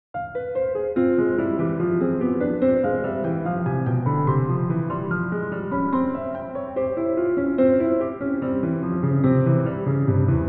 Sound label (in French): sonate